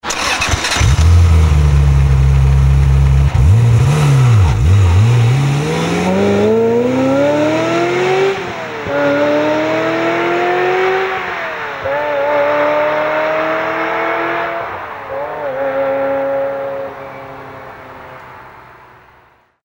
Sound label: F355 acceleration, engine sounds; ferrari